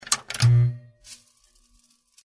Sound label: tv